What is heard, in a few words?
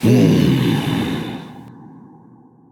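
non verbal thinking, voice; cernunnos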